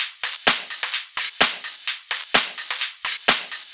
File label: EDM 128 bpm, Drums; Loops; Top; Jeans; Ableton Live 12 Lite; Core Library